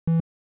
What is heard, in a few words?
move; TrustFall